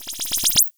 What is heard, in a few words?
Flash laser